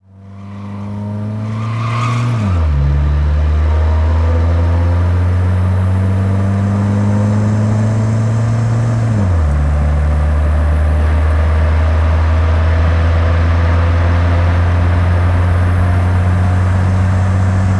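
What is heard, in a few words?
car; autko; Mafie 1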